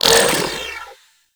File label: Droid Array Stop; Warframe; Cephalon